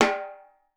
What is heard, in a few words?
repique; Definitive Percussion